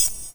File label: Korg M3R Digital Noise Hit